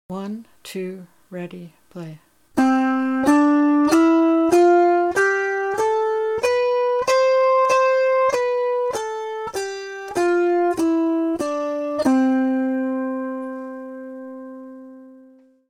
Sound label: C scale I pluck per note